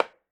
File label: sling wood, attack impact